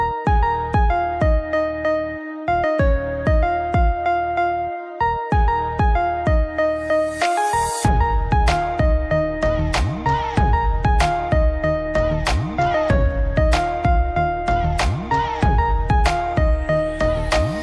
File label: Song Instrumental